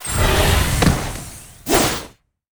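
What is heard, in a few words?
Boss Mech Naga spawn, monster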